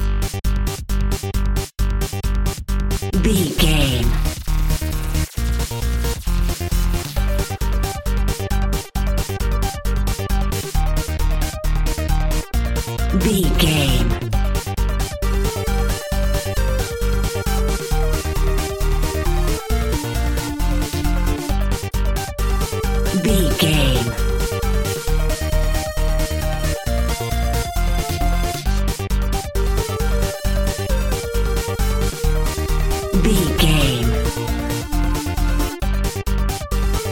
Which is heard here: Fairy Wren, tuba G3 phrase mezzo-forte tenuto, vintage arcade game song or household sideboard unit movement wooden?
vintage arcade game song